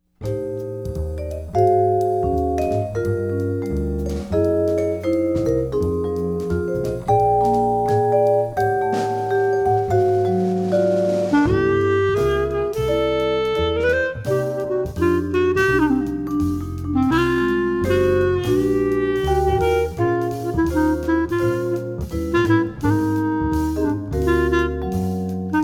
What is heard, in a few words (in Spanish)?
el cajon